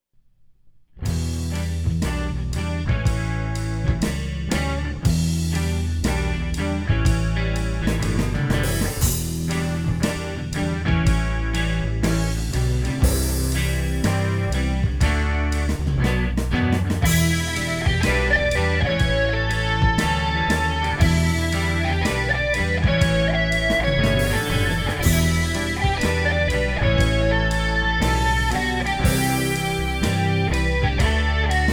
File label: Dance of Memories Medium Fast, Film Classical